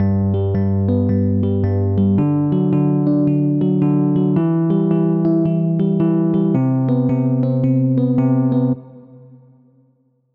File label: Folk Indie; Hybrid Standard; midi wizard